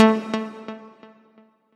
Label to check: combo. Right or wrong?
Right